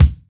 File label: bumpin kick drum single hit d key, steel kick drum sample tuned to the D note